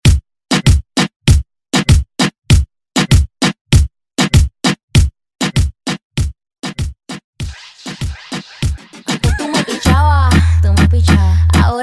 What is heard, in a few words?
Mashup 98 Bpm